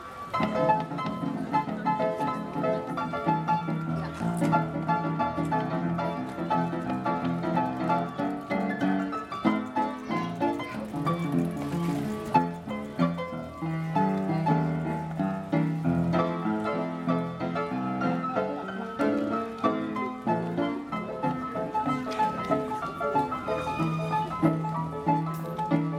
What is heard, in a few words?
piano parc leipzig